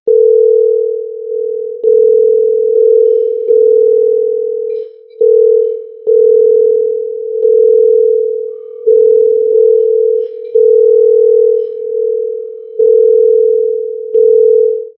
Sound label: sophisticated, aesthetic